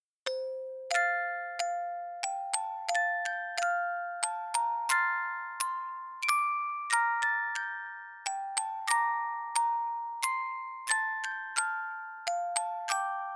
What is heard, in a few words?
Away In A Manger Instrumental